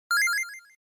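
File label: Game Boy General Pitch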